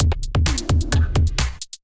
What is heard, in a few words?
Kit groove 130 bpm, Drum; MIDI Clips; Luminous Kit groove; Ableton; Factory Packs; Drum Essentials